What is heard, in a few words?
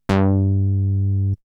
Bass; ZERO G - Datafile DISC 1